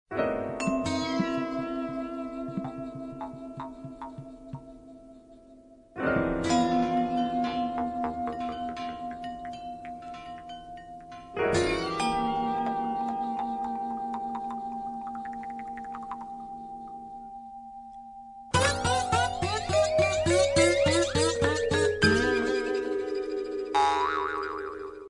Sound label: laughing guitar version ringtone